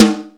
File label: R-8 no snares, Power Drums; Power Drums U.S.A